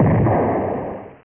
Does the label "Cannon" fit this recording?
Yes